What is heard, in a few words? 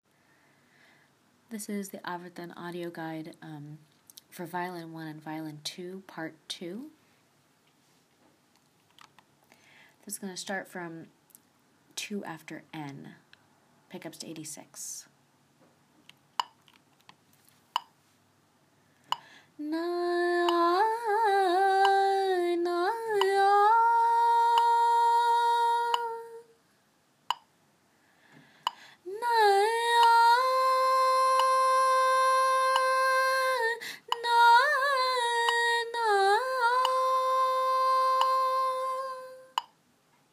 Guide Vln1 2 Part